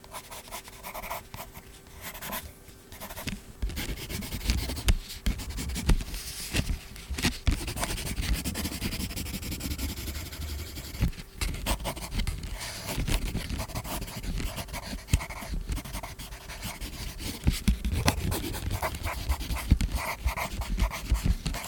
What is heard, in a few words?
Scribbling On A Piece Of Paper With A Pencil